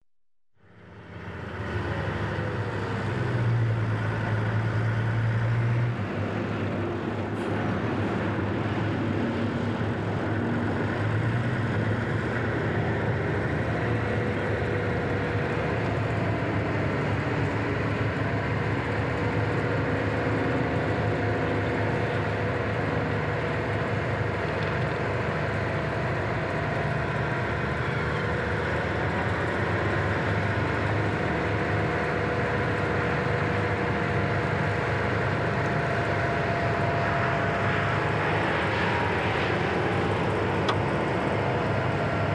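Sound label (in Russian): мусоровоз езда вокруг стройплощадки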